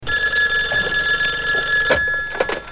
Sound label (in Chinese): 电话